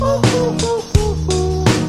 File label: hohoo